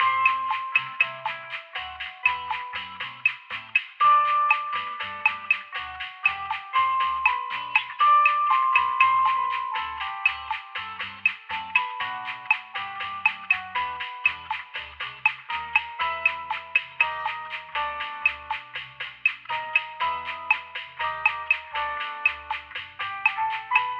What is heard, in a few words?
Royal Music Loop Speaker; super crazy rhythm castle